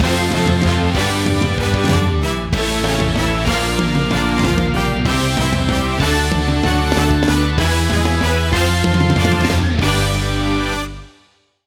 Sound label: Evolution Fanfare; nexomon extinction 2020